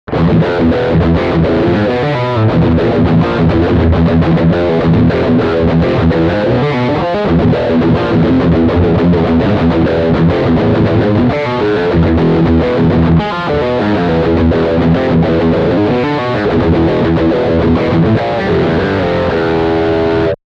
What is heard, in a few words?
Riff